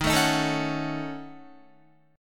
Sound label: Eb Minor 7th Flat 5th, Guitar; Ebm7b5; strummed; JGuitar